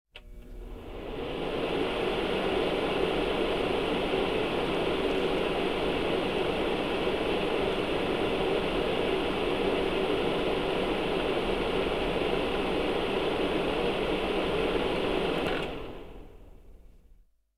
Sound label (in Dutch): Elektrische Ventilator; KMP Film Video Sound Effects